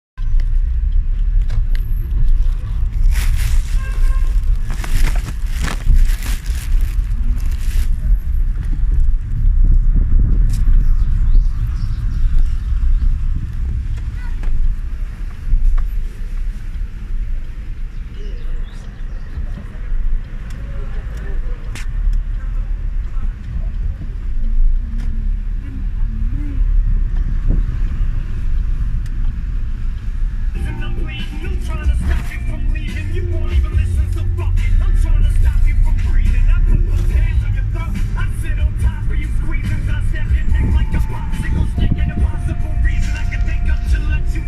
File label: Field Recording - car ride